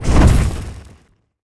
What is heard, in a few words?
fireball blast projectile spell